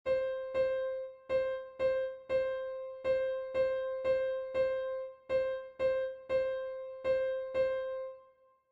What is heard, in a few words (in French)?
rythmique base, latin jazz bossa